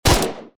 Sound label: scorpion evo fire; STALKER